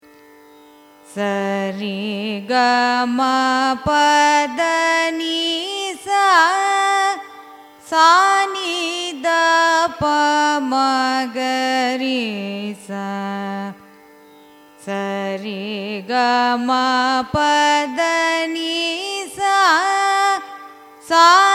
raga chakravakam arohanam avarohanam, carnatic music